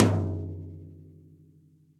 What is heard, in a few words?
Tom Low CopStyle; Drum Hits; Standard Hits; Ableton; Factory Packs; Session Drums Studio